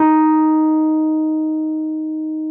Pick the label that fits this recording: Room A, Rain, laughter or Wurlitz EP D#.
Wurlitz EP D#